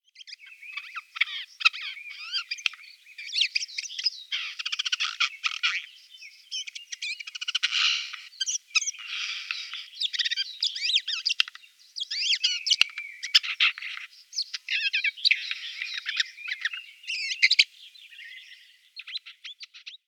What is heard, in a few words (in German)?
Eichelhaeher Garrulus glandarius